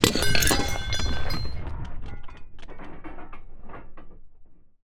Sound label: howitzer mechanical parts handling; Bluezone; Artillery